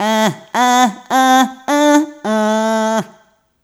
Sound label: Rhythmic A